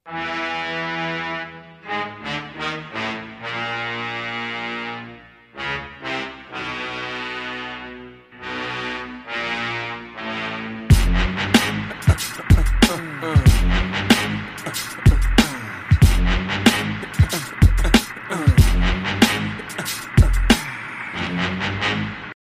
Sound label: hype